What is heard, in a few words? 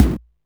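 MISC Right, Techno 138 BPM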